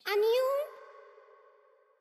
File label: Princess wink; koi princess